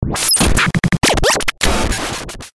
A Little Noise, Stabs & Bursts; Best Of N2O Library

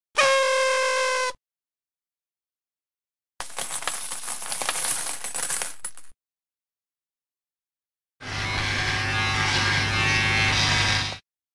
Party Horn, Coins, Shaver; 100 sound effects

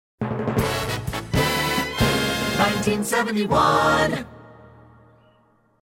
Hits, Drops

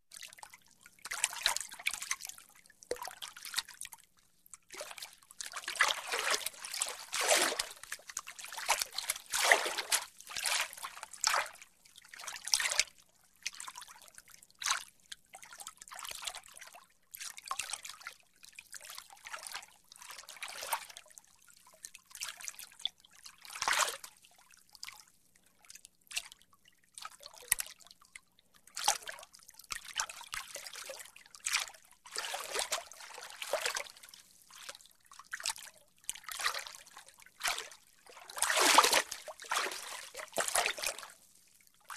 Water Light Bathtub Laps; Hollywood Edge Foley Sound Library

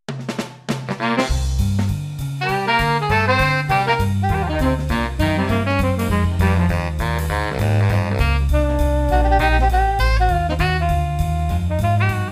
SD-90 Sax Section

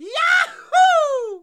yahoo, yell

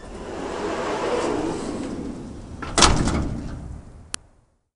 GARAGE, Doors; 1000fx

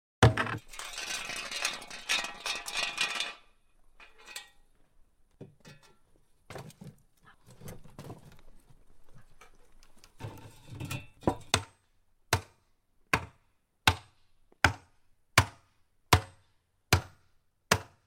Barrel Maker; Hollywood Edge Historical Series; Traffic Free BG's & FX

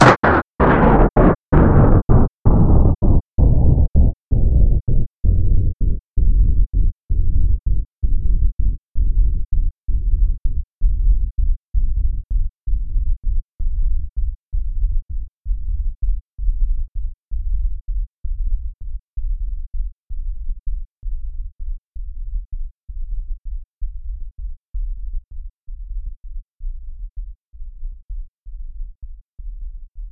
impact

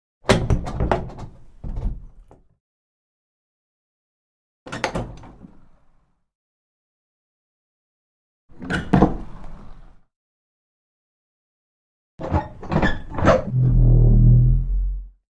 Wooden Crypt Door Medium Size; Hollywood Edge Historical Series; cd4 Castles Palaces & Monasteries

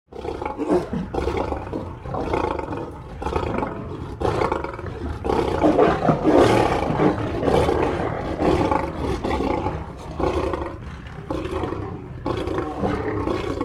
Multiple Lions Growl Softly; Birds And Animals

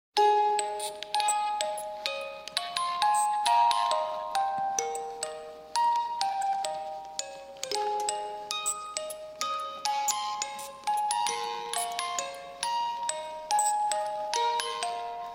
Music Box

